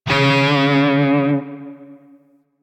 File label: Grineer Shawzin Flourish Oct Two C Sharp; Music; DE; Warframe